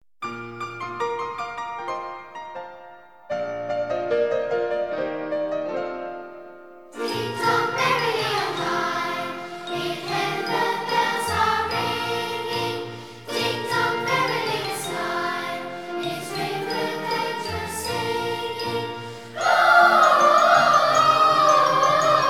the childrens choir ding dong merrily on high; Christmas; carols

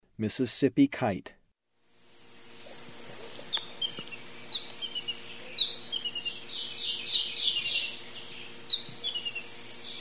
Mississippi kite, Ecuador; S. America

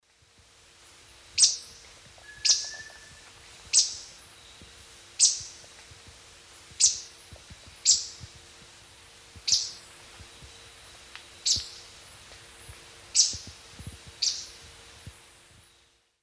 Sclerurus mexicanus, call; S. America; Eastern Lowlands

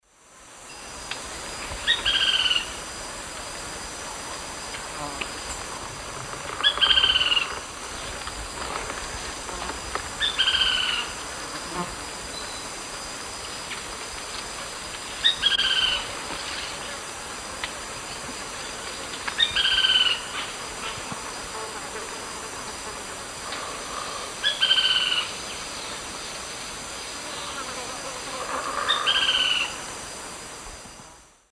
Synallaxis albigularis, song; S. America; Eastern Lowlands

